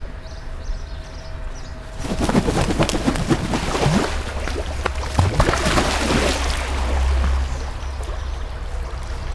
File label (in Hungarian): szárnyával csapkod, pelikán; állathangok; nyíregyházi zoo 2010